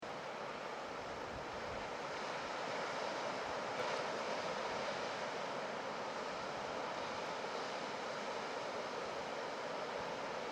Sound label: Ocean Beach Sound Of The Sea Diffuse; Ocean - Beach - Sound Of The Sea - Footsteps In Deep Water; CraftedSignals